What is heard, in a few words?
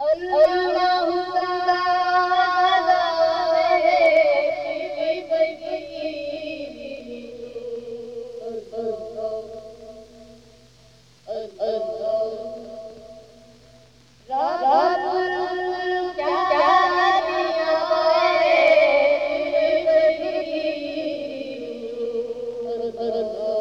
AMB ALAHOO, ETHNIC; AMG - Global Trance Mission VOL-2